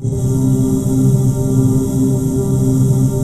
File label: VOCAL WHISP; Pro-Rec - Synthsonic